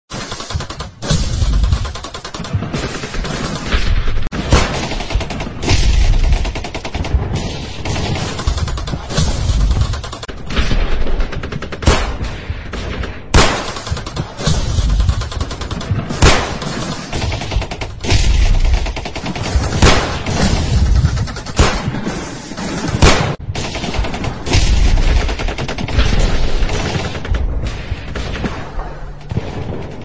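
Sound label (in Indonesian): suara perang, war sound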